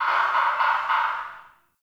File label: Cave Ocean Creature Close; Midrath Island